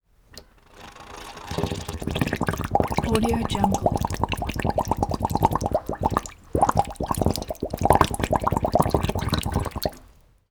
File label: drain bubbling liquid gurgle emptying drain; prosoundfx